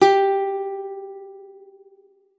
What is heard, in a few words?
G4, guitar; Xsample VOL-2